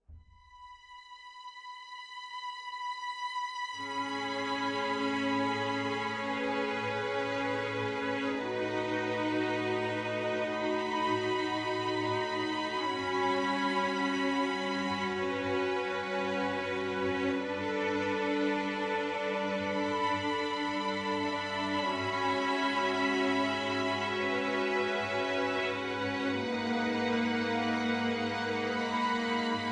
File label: Strings; Menherafflesia: Flowering Abyss; Android